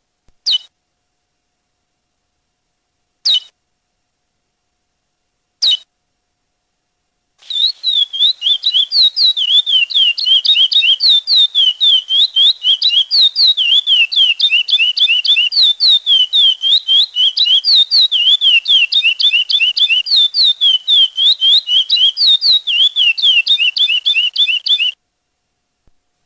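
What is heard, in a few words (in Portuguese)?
praia clássico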